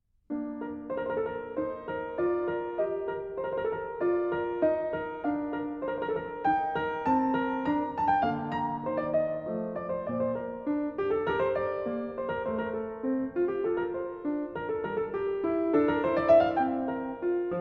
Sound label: Partita No.1 in B-dur Praeludium, Keyboard; Bach; BWV 825